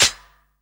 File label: SHAKERS; Best Service; Dance Mega Drums CD2